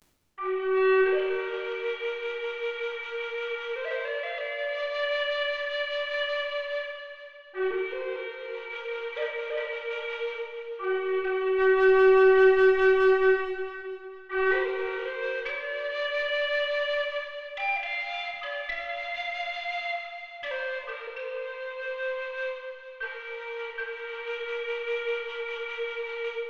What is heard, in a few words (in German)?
Fis Flöte Klangprobe